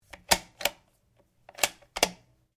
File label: RIFLE CZ 452 VARMINT 22 CALIBRE BOLT ACTION SEQUENCE; A selection of domesttic firearms; Rifles